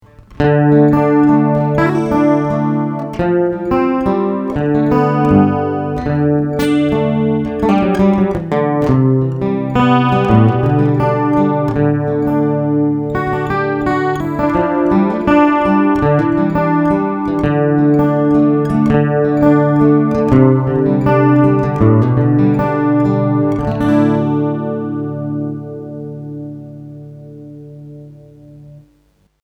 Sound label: tech21 chorus